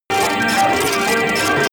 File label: sci-fi digital transmission noise